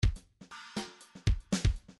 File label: bdf midi file grooves funk a funk a